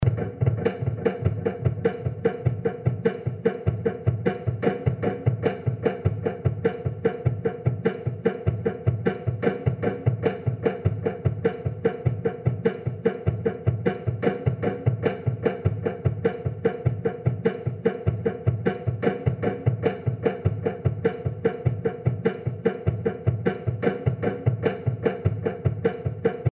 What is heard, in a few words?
60s drums industrial